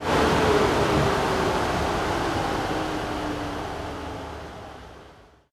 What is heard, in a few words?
engines; World of Tanks